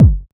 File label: perfect kick one shot c sharp key; Samplerate 96000 Hz; Bitdepth 24; kick drum samples